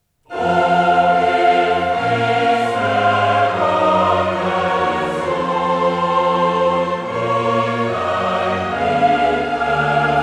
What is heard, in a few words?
Chorale; Bach Johannes-Passion BWV 245; Karl Richter (conductor); O hilf, Christe, Gottes Sohn